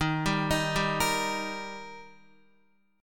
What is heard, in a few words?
D sharp Major D sharp Guitar, chord; arpeggiated; x,6,7,8,7,6; JGuitar